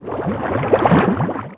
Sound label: water